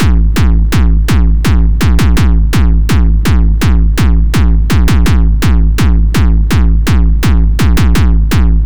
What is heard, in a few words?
microtonic